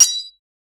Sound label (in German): schwert trifft auf schild; medieval